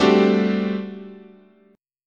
G Major 7th Suspended 2nd Suspended 4th, guitar chord